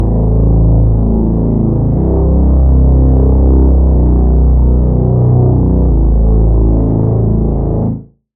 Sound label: Reese